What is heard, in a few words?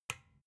desk lamp off soft